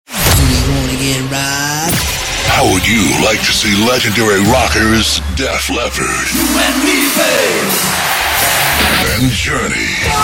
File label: CLASSIC ROCK